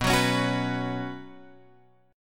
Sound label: B Suspended 2nd B Guitar Open F; strummed; JGuitar